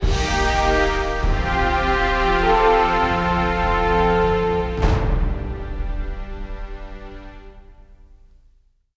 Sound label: Quest Complete Sting No Cresc Bounce